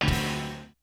HOUSE KIT, DRUMS; Kid Nepro; Elite

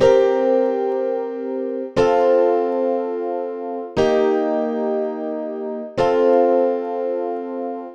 El Piano; Sonic Foundry (Sony Creative Software); Ugly Remnants VOL-2

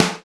DANCE SET, DRUMS

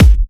Electro Kicks

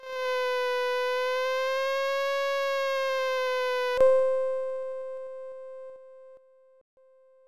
synth, amiga med

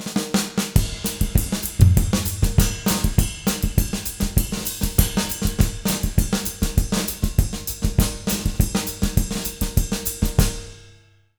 200 SAMBA, Jazz Latin Drumloops; USB Soundscan vol.08; AKAI

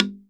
LOG DRUM

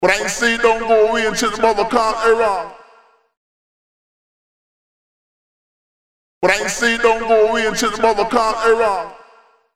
Ragga Vox, Voice FX

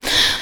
BIG BREATH; USB Soundscan vol.18; AKAI; Funky Vocals

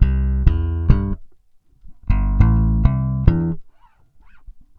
BAI BS C, BASS BAION

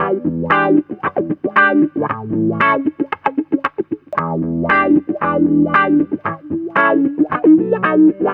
GTR; USB Soundscan vol.32; AKAI; Roots Disco

